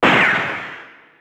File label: bang